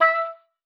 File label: OBOE E5; Xsample VOL-6